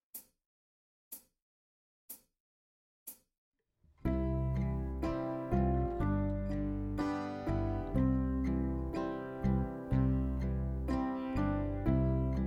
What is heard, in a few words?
My little melody playback, Bb Instruments